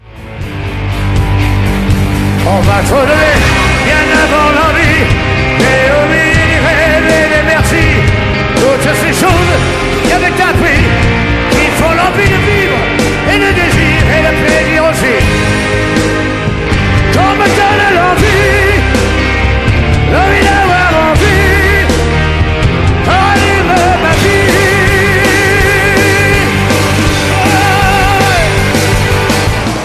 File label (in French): sonnerie